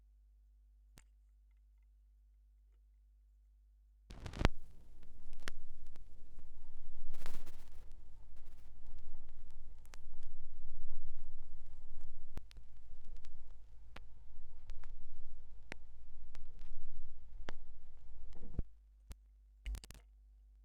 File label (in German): Schallplattengeraeusche